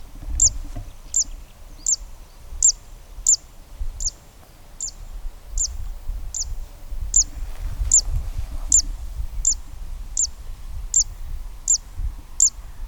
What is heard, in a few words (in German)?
Zistensaenger; Grabenstaetter Moos Chiemsee TS; Joerg Langenberg